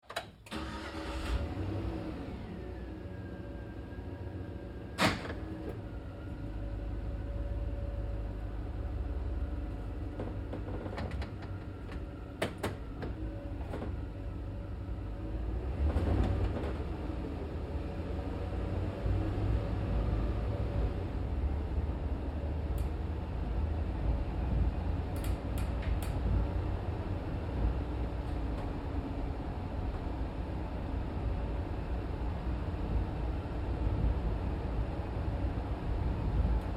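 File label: Truck GMC Savana Interior Drive Accel Decel Slow; Trucks; Big Room Sound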